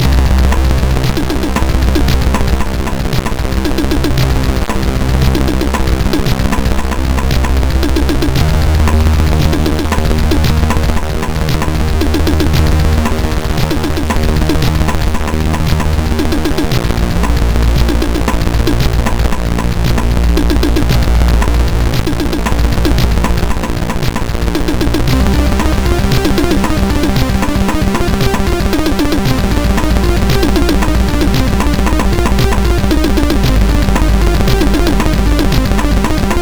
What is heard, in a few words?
Drums, sid MOS6581R2; Future Drums